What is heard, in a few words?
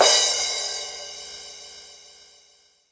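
R8 cymbal crash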